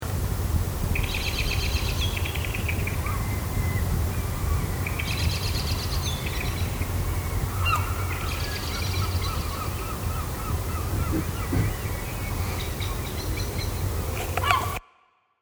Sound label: blackie alarm